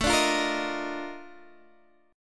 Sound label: A sharp Major 11th, chord